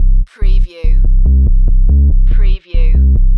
Techno-Trance Sub Bass Loop in C 142 BPM

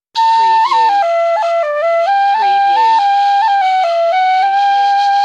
Tin Flt Riff

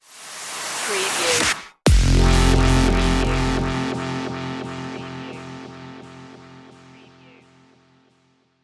sandy shoes stinger

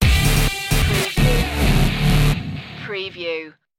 80s revenge stinger

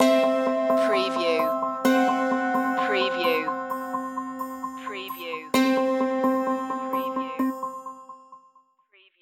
the quirky traveller stinger

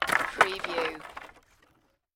Wood Junk Crash

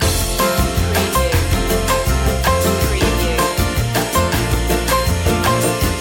latin destination loop

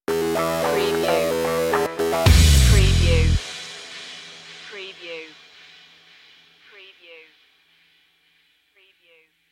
beat stinger

